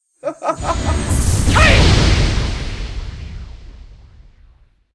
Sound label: bloodylady attack, monster